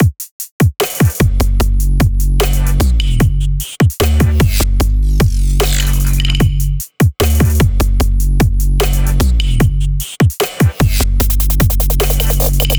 Full Drum Loop - 150 BPM G; EDM; Drums; Loops; Cymatics; EDM Starter Pack

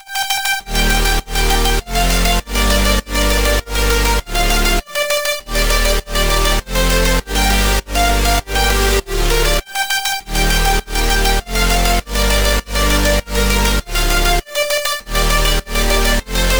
Future Bass Drop Loop 100 BPM G Min, Synths

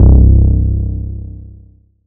BASS Unstable (C); Cymatics; Orchid Sample Pack; 808s & Basses

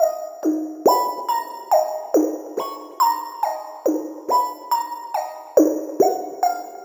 Riddim Melodic Loop D# 140 Glow; Ghosthack; Riddim Essentials Volume 2

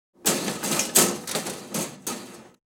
Metal Cart clanks and bangs; Fairlight Sound Lib

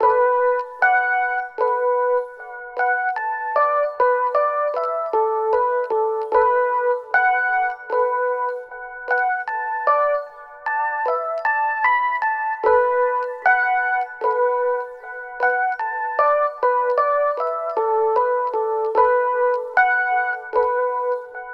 Synth Bm 76, Lo-Fi; Melody Kits; Loops; Ghosthack; Gone

